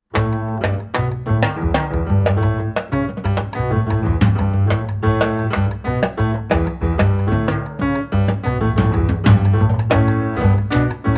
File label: session tune